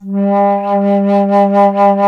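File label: flute, alto flute; Miroslav Vitous; Solo Instruments VOL-2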